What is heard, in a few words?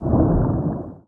Swim; Lara